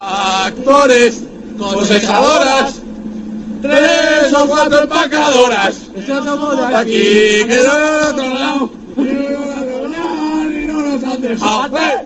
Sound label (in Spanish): tractores